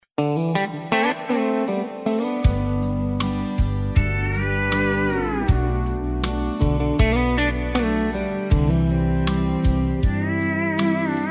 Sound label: Country Sax